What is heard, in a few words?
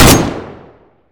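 mg3 shoot; tacz; daffas arsenal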